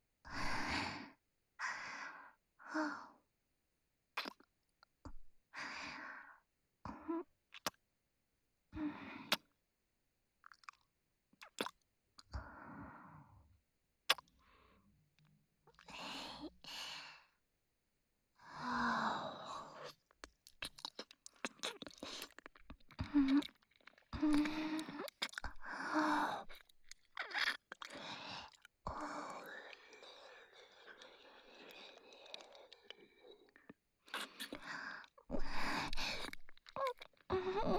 キス(接吻)

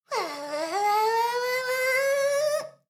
シコシコ音声; ダメなメイド

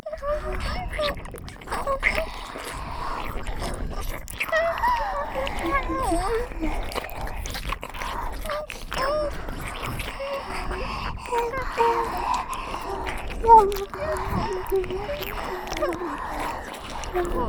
ディープキス＋イラマチオ＋両耳舐めループ 耳塞ぎver, ASMR

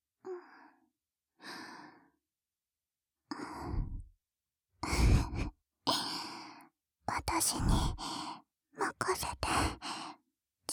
耳舐め, ASMR

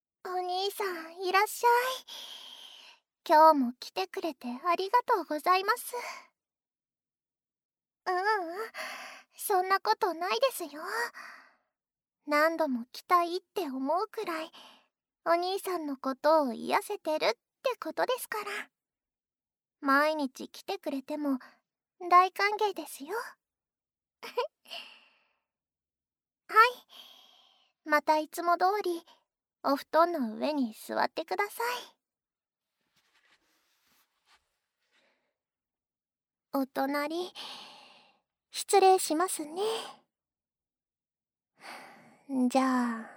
耳舐めノイズ, ASMR; ノイズサポートは睡眠の質が向上するんですよ

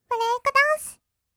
ブレイクダンス; 1200 kawaii voice!!

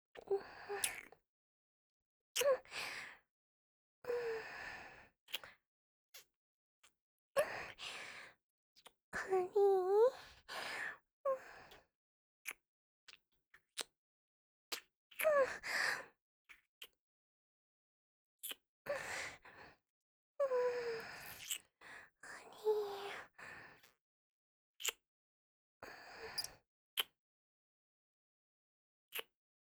kiss

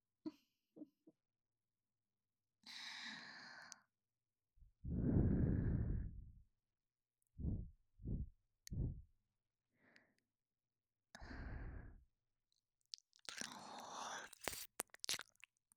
耳舐め, ASMR

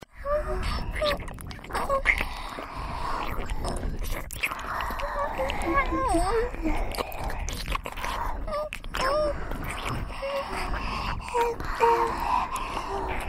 ディープキス＋両耳舐めループ（耳塞ぎver）

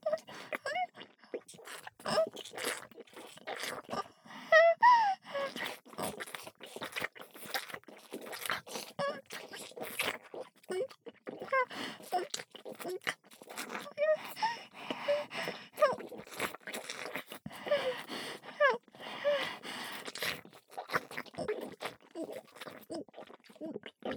イラマチオループ

